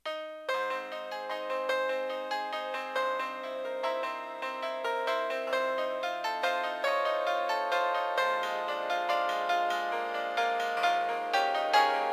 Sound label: nokia 1208 ringtone; liszt